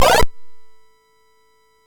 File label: Dragonworld sid MOS6581R4